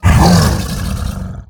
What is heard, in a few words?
Withered Longneck Attack